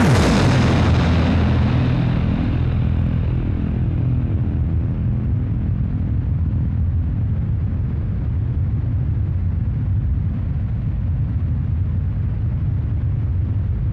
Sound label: Explosion